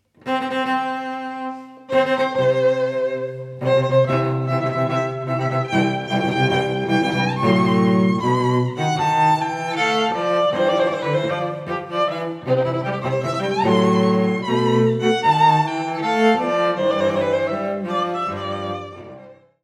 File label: wedding march; mendelssohn